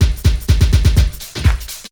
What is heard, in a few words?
drm 125, drumloops; PS-28 Vocal House Samples; frontofhouse